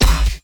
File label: Stab G